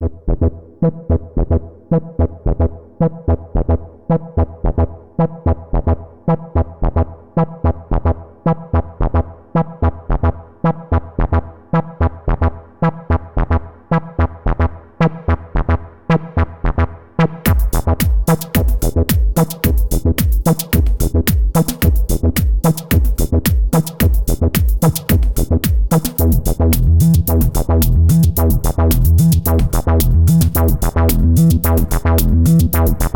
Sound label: midibox sid bassline pulse